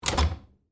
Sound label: Door Knob Turn, Foley, Door, Knob, Turn, Hard, Wood, Bathroom; Miscellaneous Door